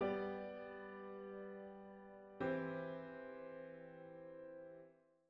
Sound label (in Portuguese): Estudo de Harmonia acorde de sexta cadência imperfeita